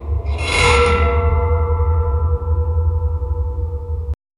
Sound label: stone scraping chisel sca; metallic